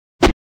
Swish Single

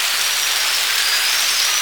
APPLAUSE; 1000fx

